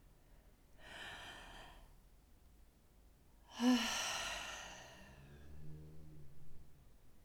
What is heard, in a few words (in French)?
soupir; Index des soupirs; Hadjithomas Joreige